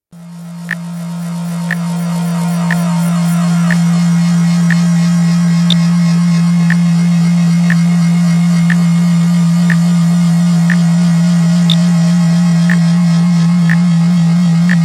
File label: Signals